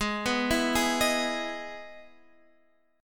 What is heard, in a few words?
E Major 7th Ab, chord